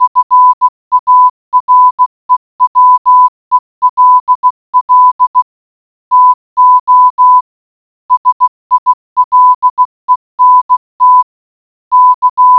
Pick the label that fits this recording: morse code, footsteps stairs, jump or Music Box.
morse code